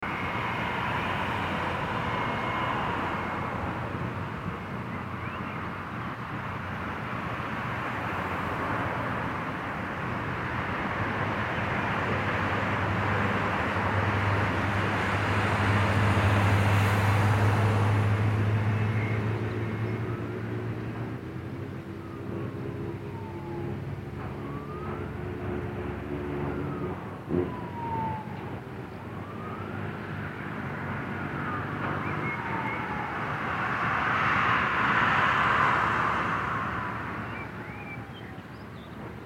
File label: busy city streets ambience